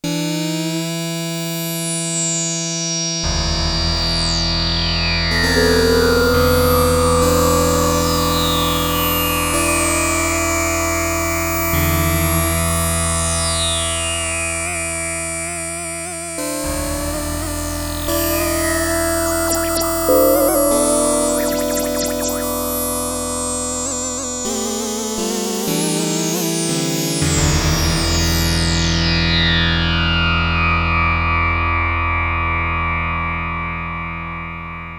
Korg DW-8000 SYNTH SITAL, Factory Patches

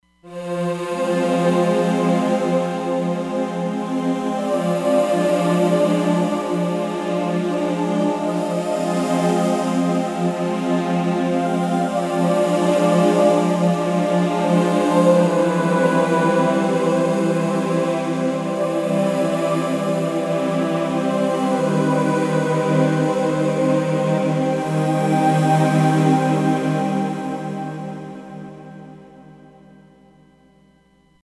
Roland Fantom XR; Angelique

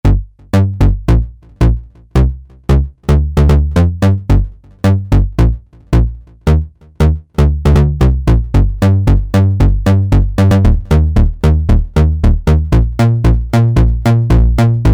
Snap Bass, Novation XioSynth

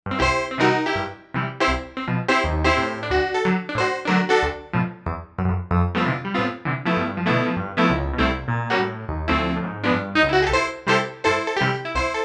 Saloon Doors; Novation UltraNova; Daniel Fisher Volume 1

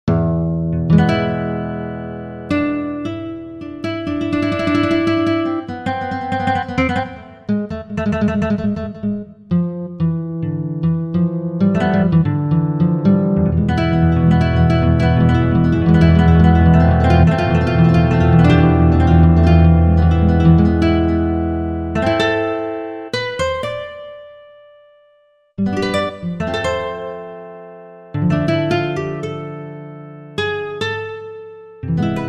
Spanish Gtr; Roland SRX-07 Ultimate Keys

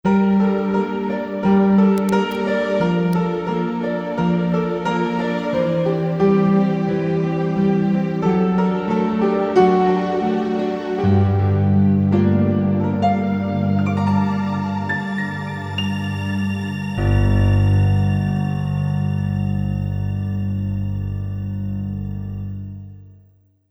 Cinema Piano

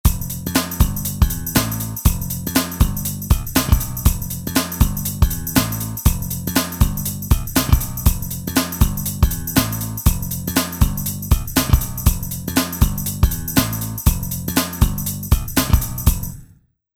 Funk

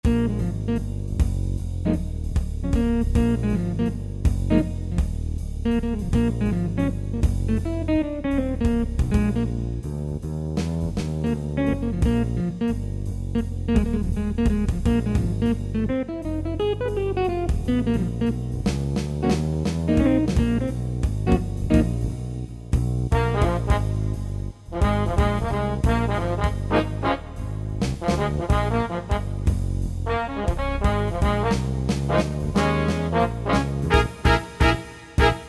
Gtr Jazz Band

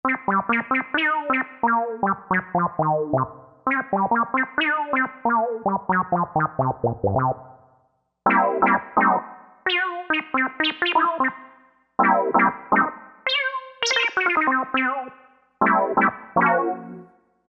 Analog Scuttlin, Korg TR-Rack

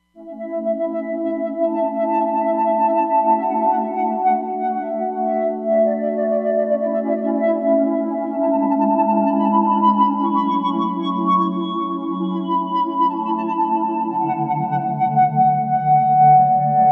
Silky Pad, Roland Fantom XR

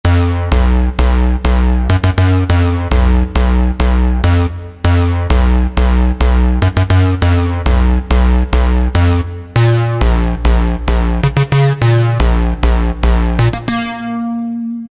SYNC BASS; Akai SG01v

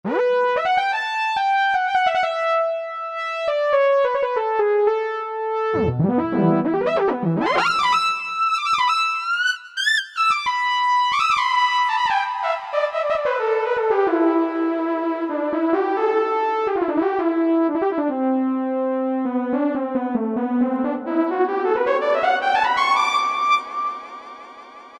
Lead, Vintage Synthesizers; Alesis Z5; Classic Beatboxes; Obie Lead

